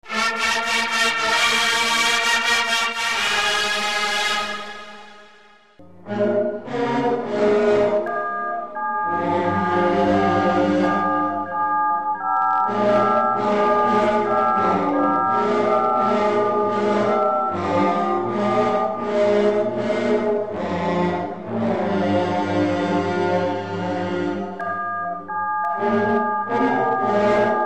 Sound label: fan fare funeral electro